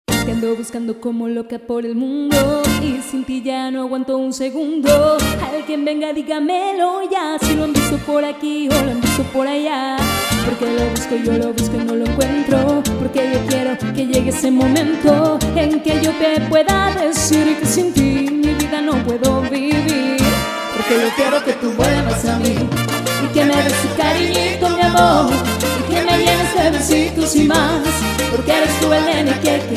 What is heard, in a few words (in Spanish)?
Salsa